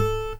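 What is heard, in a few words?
soft finger, Guitar; 90 s Sample CDs; Quantum Leap - Guitar & Bass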